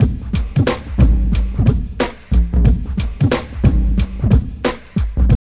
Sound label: TRIP 90, trip loop